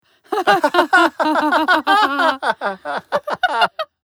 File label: Laughs